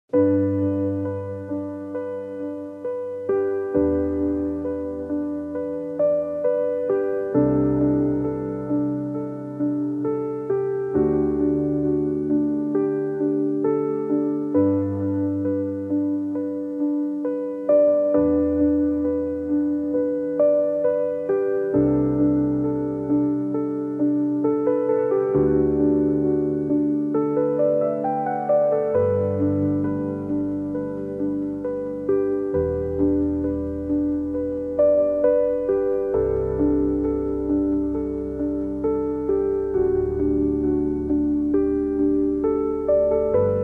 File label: silent piano